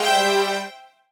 strings

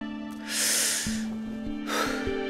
hat; 音MAD; 少女终末旅行; 소녀 종말 여행 음원팩; Syaro Sample

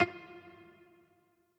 piano riff intro

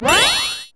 GAME TOUCH HOLD GREAT; maimai

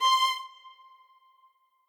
string

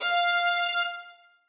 string

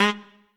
Trumpet melo

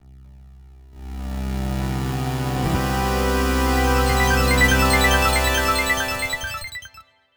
game start; 主播女孩重度依赖

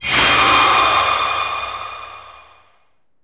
SE GAME SLIDE; maimai

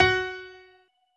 piano

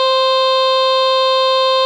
Lead C6; 刘醒哥

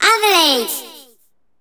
SE GAME BREAK; maimai

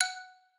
xylo

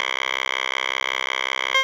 bass; gabe

